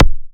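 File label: kick, ARP 2600 Drums; Polyend; Simulacrum Sounds; 1 Shots; Synths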